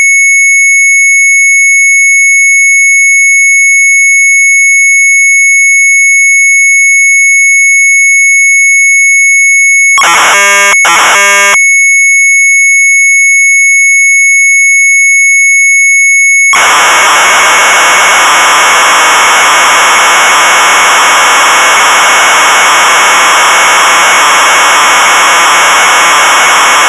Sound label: MZ800 Tape; UITLEG; retromelsarchive; sharp mz800